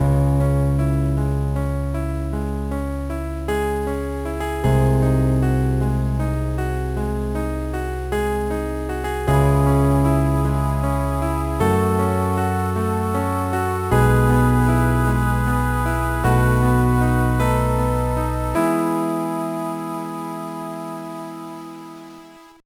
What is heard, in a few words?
BGM